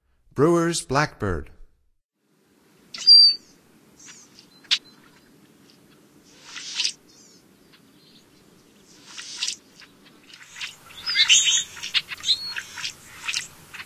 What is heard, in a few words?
Brewers Blackbird, Songs of Birds of North America; Stokes